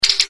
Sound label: Turn Stop